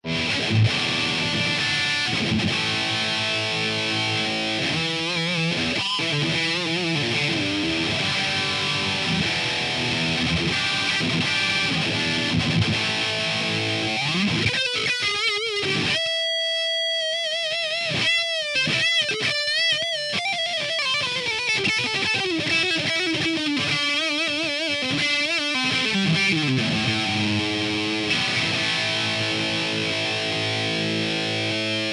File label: C Quarta DSL100, Lead